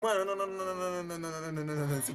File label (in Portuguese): motoca